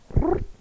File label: alert